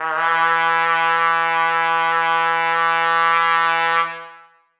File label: trumpet e3; Sonatina Symphonic Orchestra